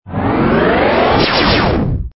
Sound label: Stinger; STINGERS